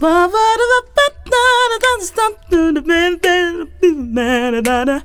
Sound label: FEM VOX, Hip Hop